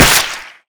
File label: whip hit